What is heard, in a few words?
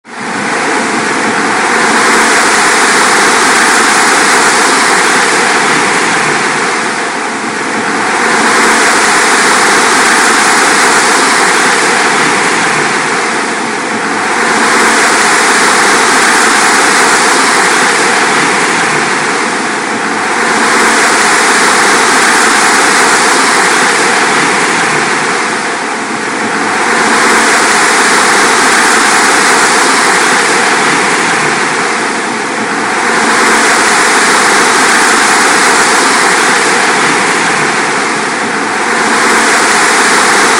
wind gust loop; Irene